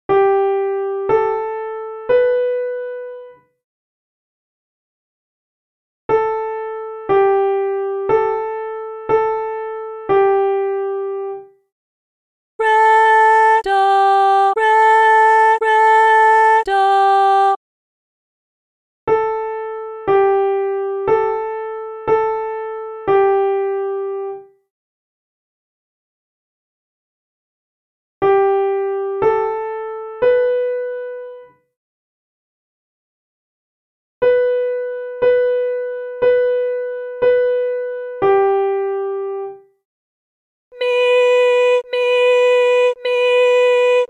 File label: Medium Training Solfa